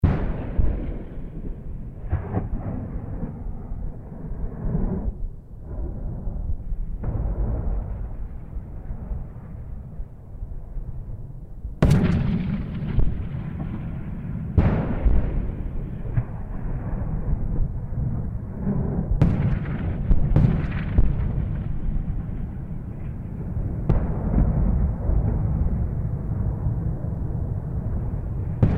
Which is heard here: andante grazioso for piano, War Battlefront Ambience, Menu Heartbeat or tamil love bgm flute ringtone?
War Battlefront Ambience